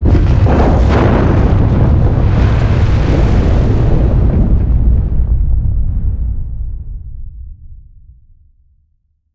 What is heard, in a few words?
Exposion Source